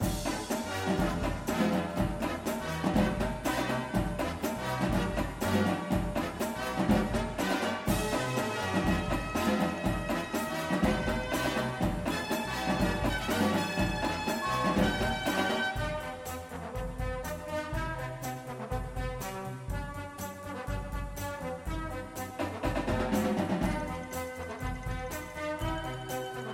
tenors with music, drumline

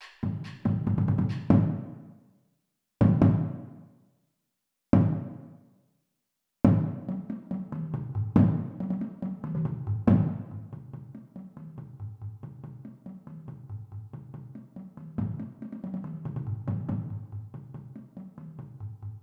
bass, drumline

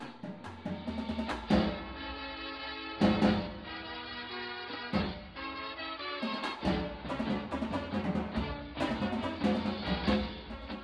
tenors w music, drumline

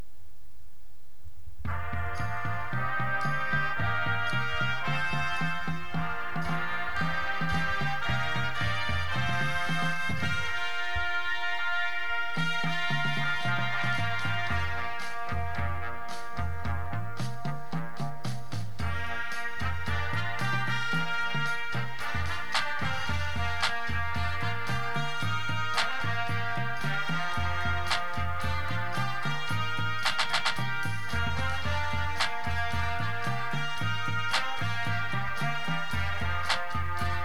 bass with music, drumline

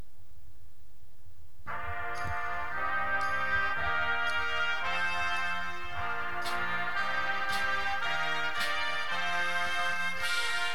cymbals with music

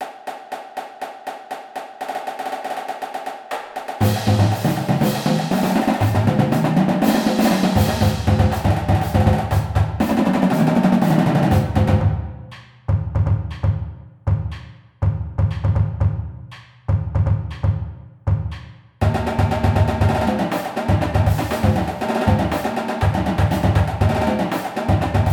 cadence

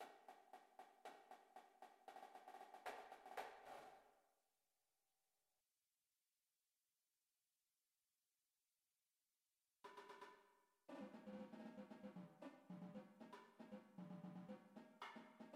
cadence tenors, drumline

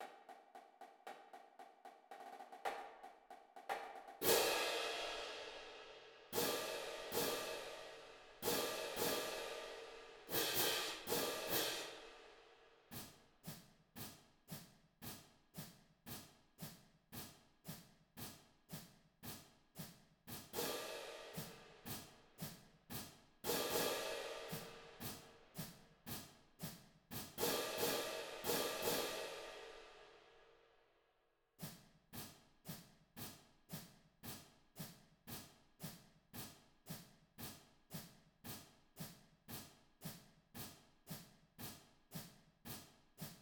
cadence cymbals

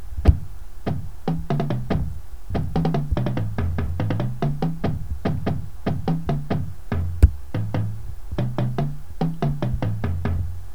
bass

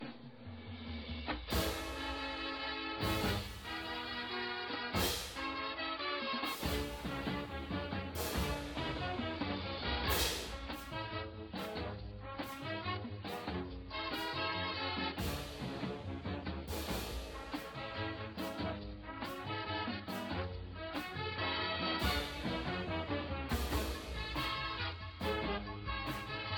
cymbals w music, drumline

